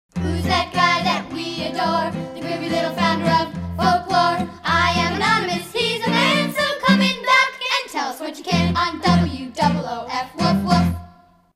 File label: jingle